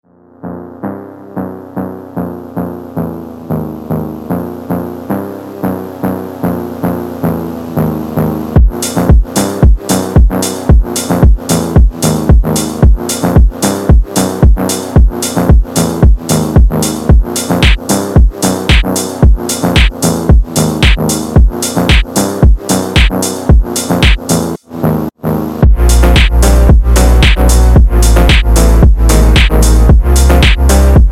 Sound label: Phonk, slowed